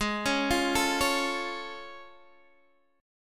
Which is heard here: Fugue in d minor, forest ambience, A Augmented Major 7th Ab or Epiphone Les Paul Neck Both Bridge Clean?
A Augmented Major 7th Ab